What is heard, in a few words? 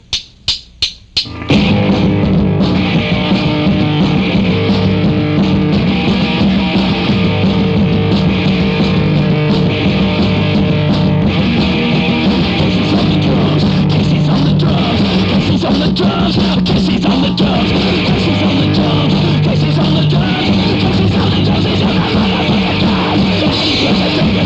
Drums